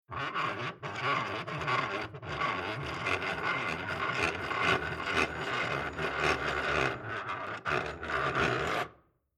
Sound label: Industrial Old Vice Open Wound Out Metal Scraping Rattling Mechanical; Scrape